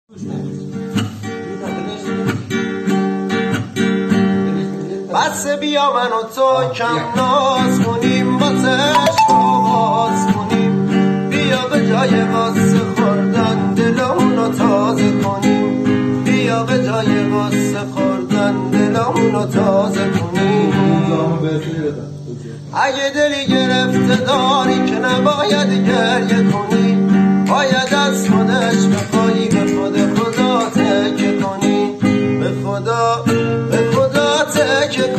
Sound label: basse